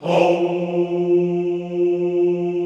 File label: HOUUU, Classical Choir